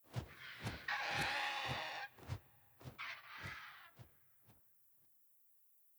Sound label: Vulture Overhead Fly By; Devour; The Town; 3D Environment; devour 2021 gamerip pc macos